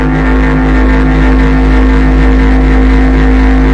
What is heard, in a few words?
buzz drone; SYNTH AND ELECTRONIC LOOPS